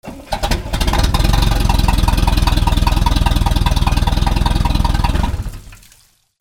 BOATS

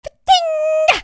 CARTOON

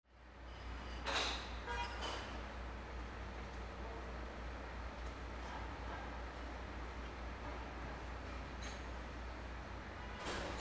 VEHICLES

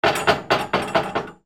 BANGS

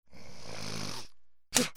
PEOPLE

